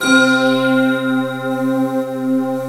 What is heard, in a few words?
bell; awe32